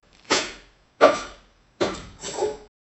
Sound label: the man has difficulty li, effort